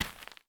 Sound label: Footsteps; DOTSSample